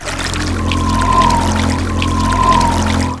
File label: wind